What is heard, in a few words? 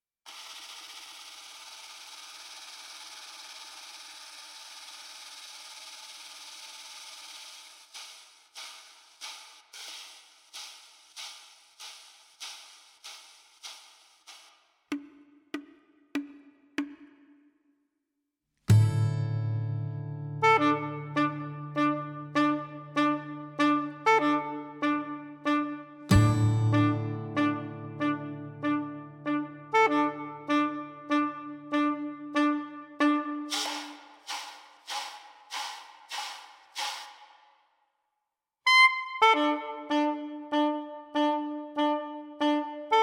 Sirtaki